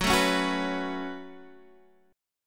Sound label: B 7th Suspended 2nd F sharp